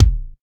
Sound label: dry kick sound e key; kick drum samples